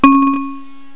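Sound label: XYLOPHONE NOTE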